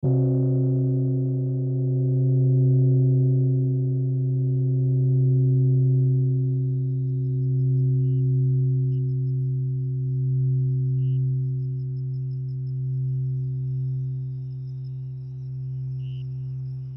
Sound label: Singing Bowl with Night Crickets & 10Mhz (Alpha) Binaural Tone; Meditation Helper; Meditation Boosters; crystalbundle